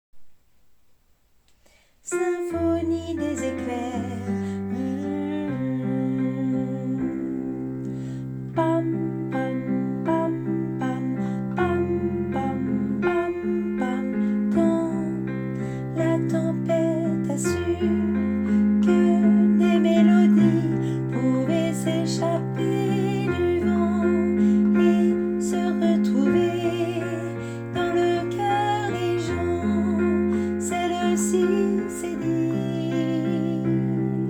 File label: alto